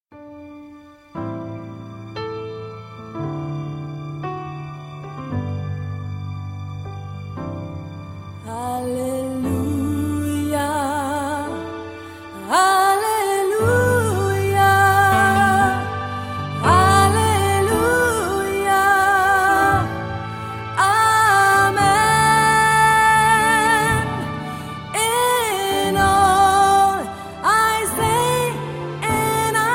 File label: Halleluja Amen joyful gospel